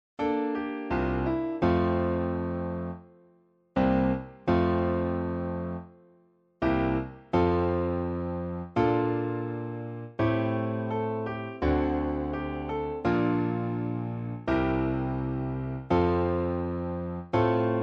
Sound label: piano; 我は海の子